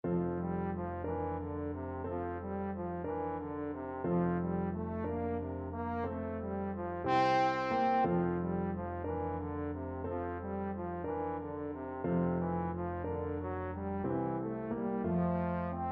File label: slumber song, trombone